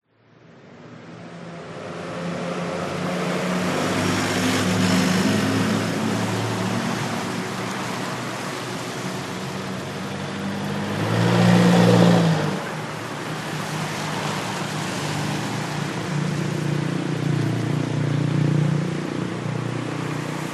traffic motorcycles cars wet road, thailand chiangmai